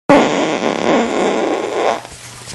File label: pushy fart